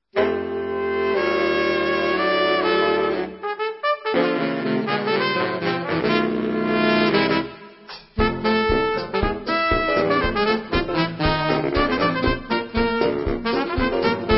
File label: clarinet